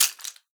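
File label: rattlebone, percussion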